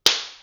baffe

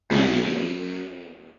pet

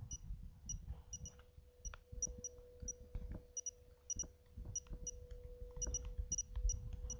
bipbip

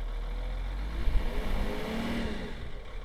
acceleration

